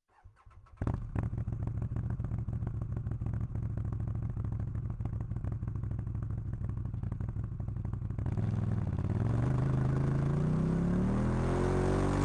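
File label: textron wildcat x onboard medium start drive stop reverse stop drive stop off exhaust; center 4062; Sonniss GDC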